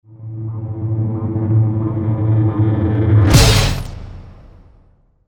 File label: build to boom